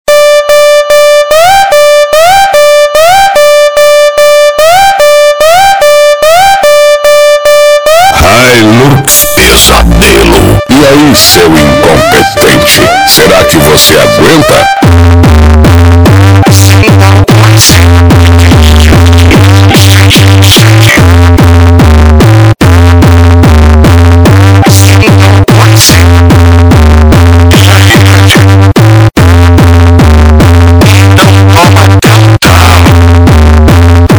funk bass

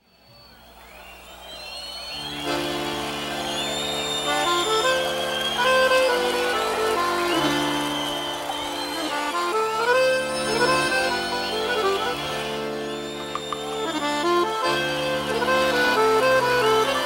sertanejo

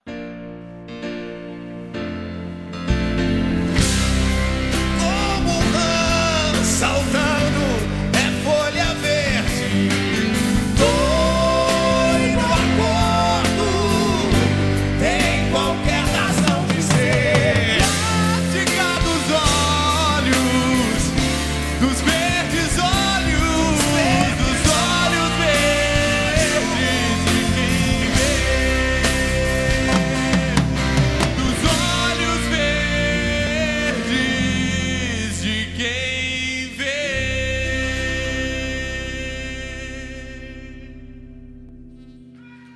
trecho vivo

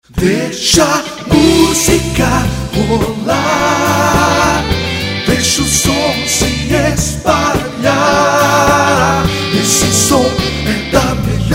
vinheta radio

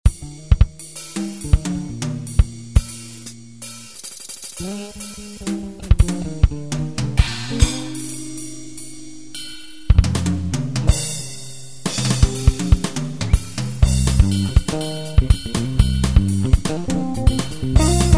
baixo mascado

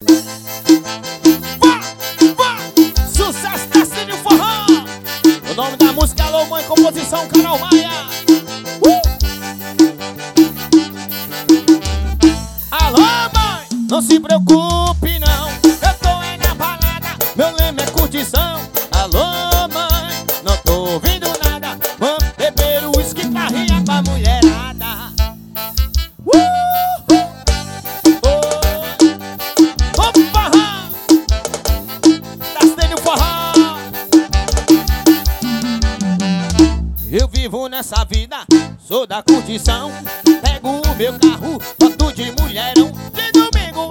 forro

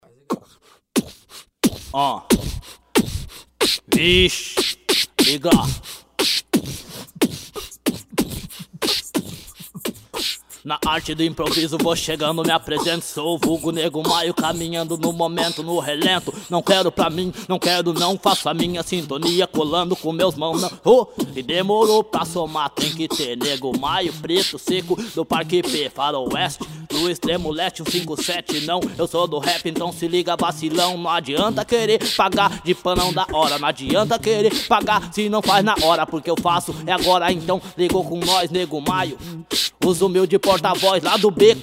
improviso pitchbox

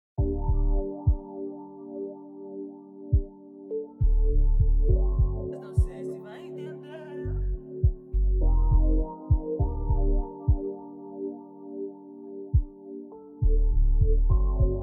rap

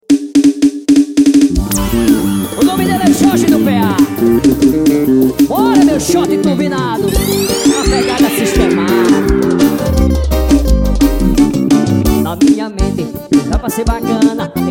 xote; xote turbinado

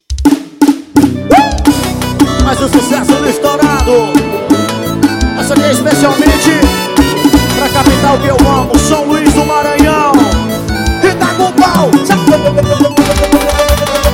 forro estourado; top gear

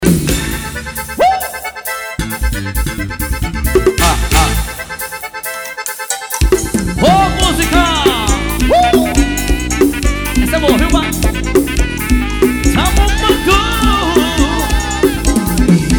baião

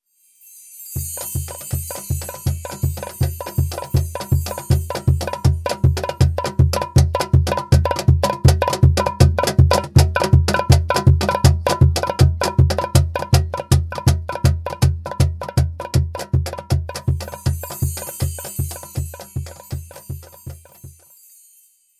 tambor sem crioula

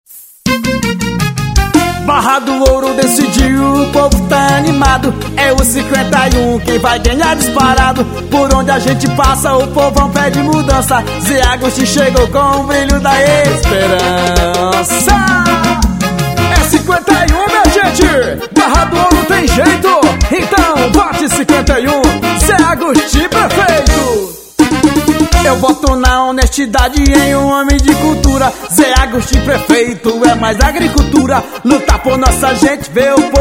jingle; ze agustin 51 zero boi

